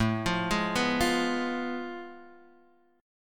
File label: F Diminished A; Fdim/A arpeggiated; JGuitar